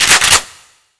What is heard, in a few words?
Reload; WarRock